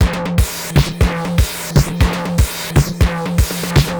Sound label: Distorted TR 505 (120BPM), beats; musicradar; cyborg beats